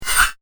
Magic Scimitar, Sword; fantasy; Sword Sound Effects; combat; role playing games, magic videogames; Andrew Fleming

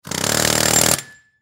Air Chisel, Power Tool; Power Tools; Industry

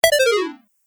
Arcade Game Down Sound; Video Game Sound Effects; Retro Inspired; Nintendo, Atari, C64

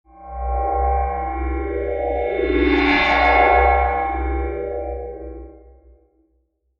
Mysterious Metal Transition; Mysterious Metal Transition Effect; Scary Soundscapes; Crafted Signals

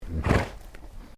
ANIMAL HORSE QUARTERHORSE SNORT; Various domestic horses; Animals

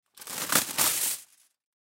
Aluminum Foil Tear, Aluminum Foil Tear Off Sheet; Metal; Materials; Foley; Blastwave

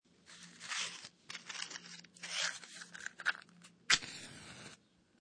Matches Open Match Box Take Out Match Light Match; Scrape Sound Effects

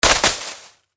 Impact Leaves Object Drop On Foliage; Rustling; Blastwave

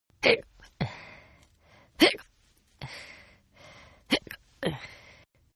Hiccup Female; Hiccup Female 2; Hiccupping Sound Effects; Human

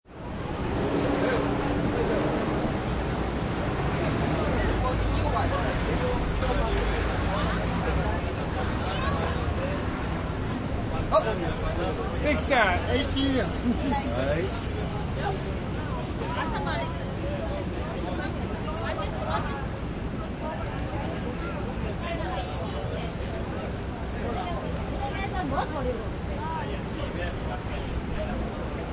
City Traffic, Chinatown, Street vend; City Traffic - NYC, Chinatown, corner of Canal Street and Lafayette. Street vendors, people talking and walking by, heavy traffic from car

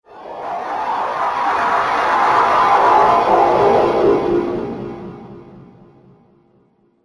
Fantasy Spell, magic sound effect

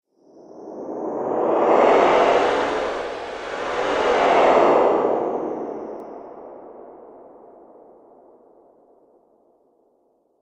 Slower In And Out Zoom, whoosh; Swish, Zoom, Swipe, Whoosh, Swoop

